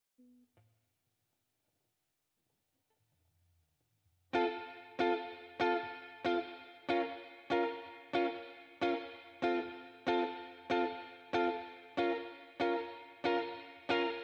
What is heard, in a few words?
Guitar